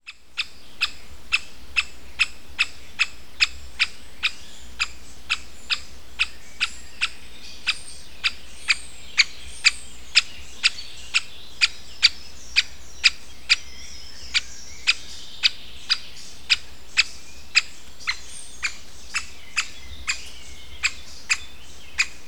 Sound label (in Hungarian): nagy fakopáncs kapcsolattartó hangjai